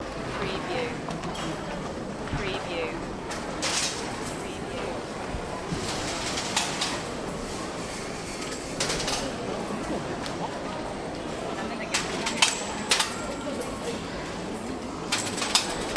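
ambience public chatter coins clang Tokyo Japan shrine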